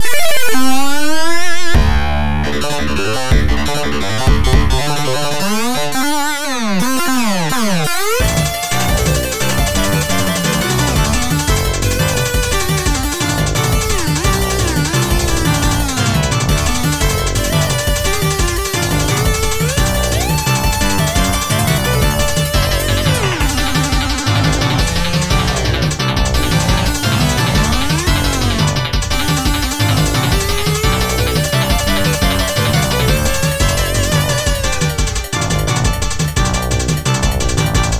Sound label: Jackpot Collected, pinball; Dr. Dude and His Excellent Ray; Bally